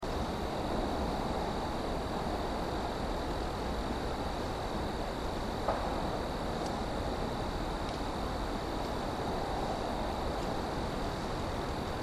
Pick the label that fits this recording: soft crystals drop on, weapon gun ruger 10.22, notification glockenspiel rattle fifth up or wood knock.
wood knock